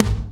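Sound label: bass drum, right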